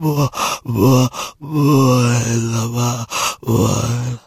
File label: zombie die; STALZONE; customnpcs; stalker